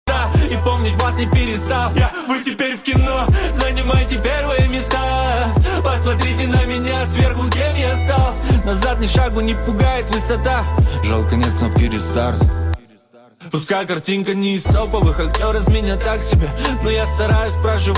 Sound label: Phonk Remix